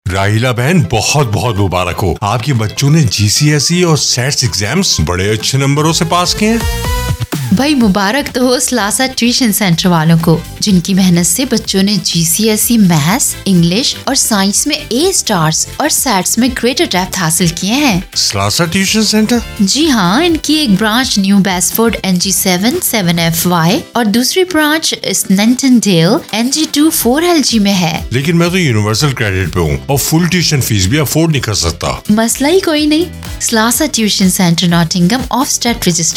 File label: Salsa ad